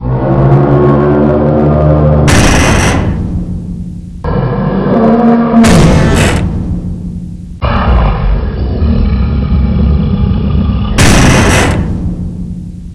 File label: monster attack; ambience